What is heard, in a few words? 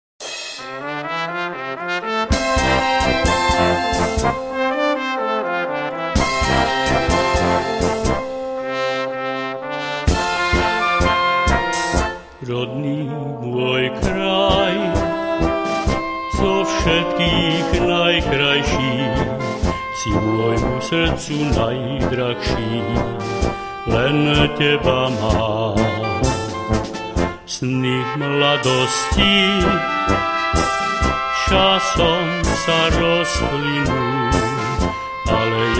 tango